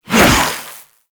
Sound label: Briefcase Katana Whoosh; Warframe; Weapons; Corpus